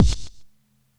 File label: Kick Tailed